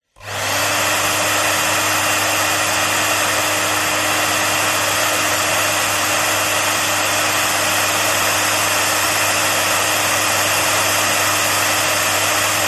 household hairdryer on run static off rear professional; visage; zapsplat